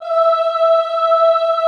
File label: OOH E4, Classical Choir; Best Service; Extended Classical Choir